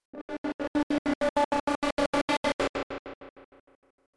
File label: Cyber Punk